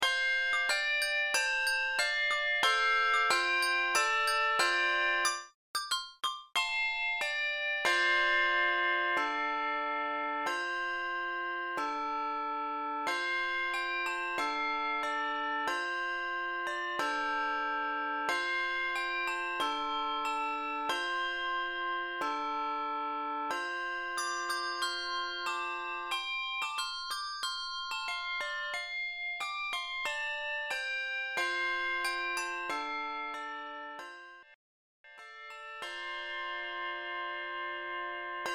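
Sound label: handbell anthem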